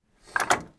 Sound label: Vehicle Hatches; Red Orchestra Ostfront 41-45